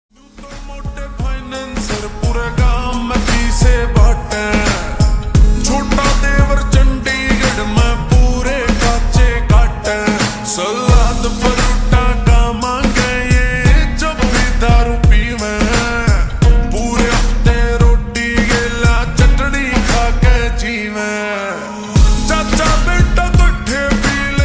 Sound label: Slowed Reverb Ringtone